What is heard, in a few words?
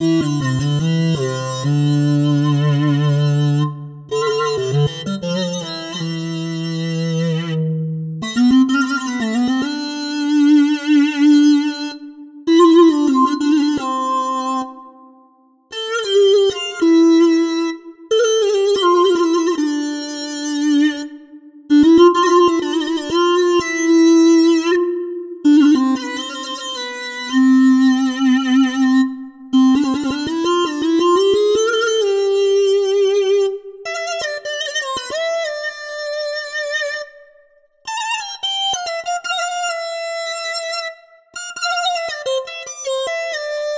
Bowed Reed, String Studio; aas string studio vs 1 dreamstate; Preset spotlight; Electric Himalaya